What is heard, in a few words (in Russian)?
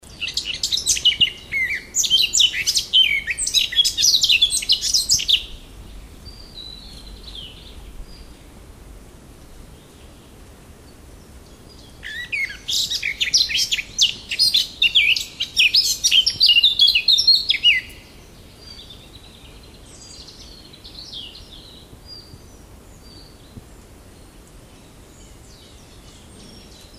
ЧЕРНОГОЛОВКА, birdsong